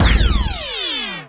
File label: enemy Flying Buzzer Zap Electric; gamerip; Mars Sortie